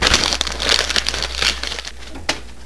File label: Throwing it away, Trash Sound; throwing away paper